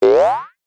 cartoon boing ascend jaw harp; zapsplat

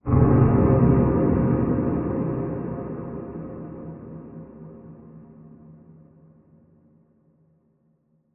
Impact Cinematic distant dark hit with gong

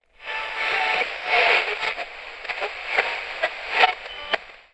radio tuning between stations static

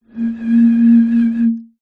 foley bottle wine blow air top liquid inside

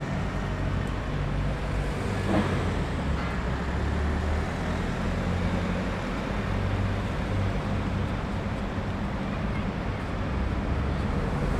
ambience london waterloo place traffic people

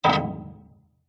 impact metal huge heavy hit with scrape

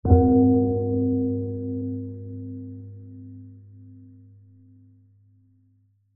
bell large heavy soft hit chime; zapsplat

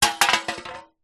food drink soda can empty drop wood deck

